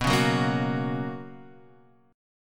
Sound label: B Major 7th Suspended 2nd B down Guitar Half Step Down